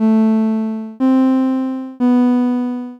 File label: triad